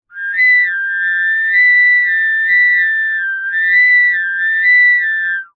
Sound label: foley pipe plastic tube air blow through unharmonic shrill musical tone